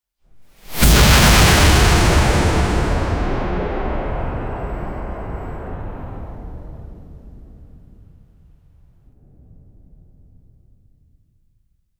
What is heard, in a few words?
a powerful fantasy sound, magical energy